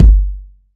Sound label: ambient kickdrum g key